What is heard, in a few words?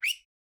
foley